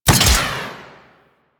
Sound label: Ball Super Throw; Warframe; Missions; Tenno Ball